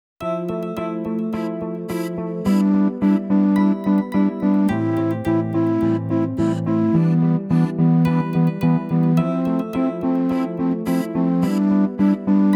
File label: Go Keys 5 Pop Beat Instrumental